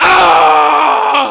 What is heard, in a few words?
male death; alienshooter